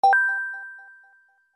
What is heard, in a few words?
Switch Eshop Button